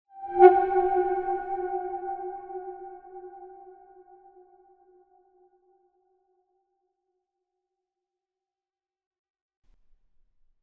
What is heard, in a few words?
horror groan wooden haunting; zapsplat